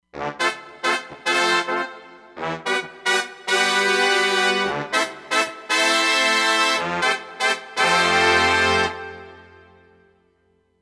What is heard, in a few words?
Brass Part Out